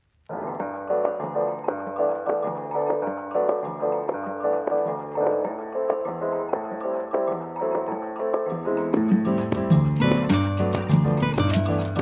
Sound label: digital samba; bossa